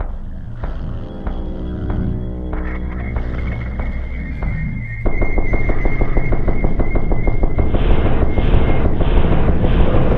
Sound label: chase theme; slender; cof; sawer